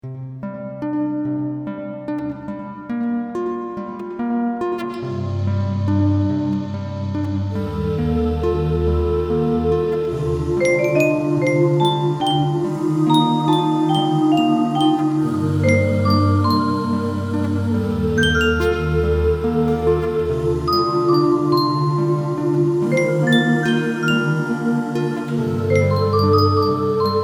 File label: lullabye